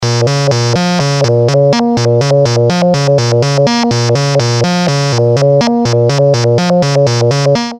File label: VC Clock PWM